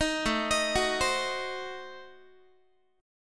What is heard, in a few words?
A Diminished 7th A down Guitar; Adim7 arpeggiated; JGuitar